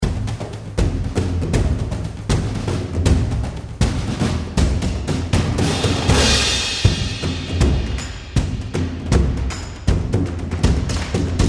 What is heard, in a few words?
chase percussion; Poptropica